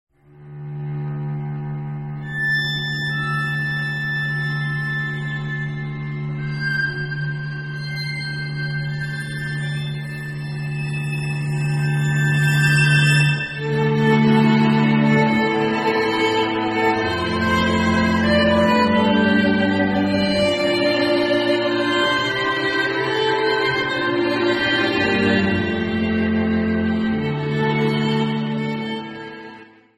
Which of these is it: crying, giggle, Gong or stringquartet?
stringquartet